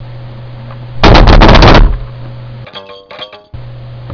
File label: afro string